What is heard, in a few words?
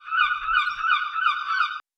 Seagull